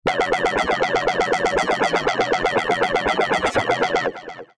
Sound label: Synth Mono